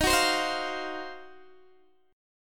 D sharp Minor 6th Add 9th D sharp Guitar Open D, chord